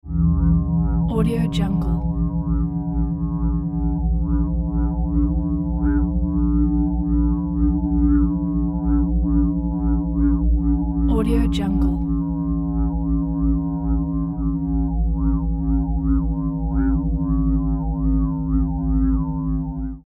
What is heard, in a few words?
hi tech alien force field; futuristic sounds